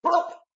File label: Gag; Lewd SFX